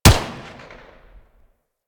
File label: Pistol Shot, Weapons; Harlequin; Warframe; Tenno